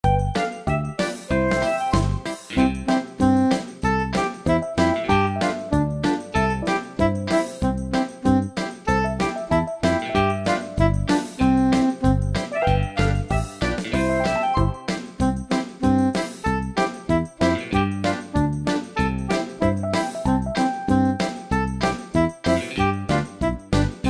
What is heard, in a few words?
Backing track; Bina Mil; 3 verses; yaama gamilaraay; ngaya bana my body